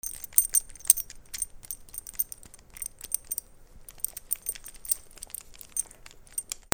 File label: BANGS